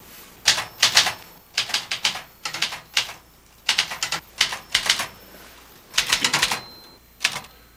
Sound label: Off Screen Typing, typing